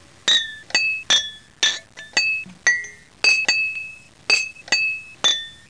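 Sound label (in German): glasklirren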